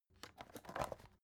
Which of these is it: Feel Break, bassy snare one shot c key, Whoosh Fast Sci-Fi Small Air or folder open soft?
folder open soft